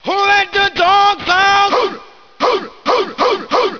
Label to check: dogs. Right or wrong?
Right